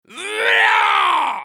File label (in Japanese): 攻撃長; グルトルデ